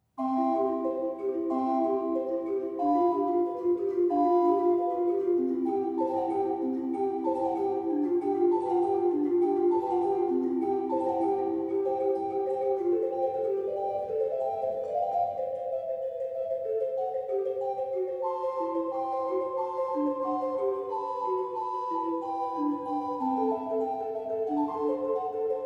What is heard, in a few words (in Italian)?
allegro moderato